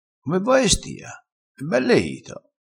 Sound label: e bell B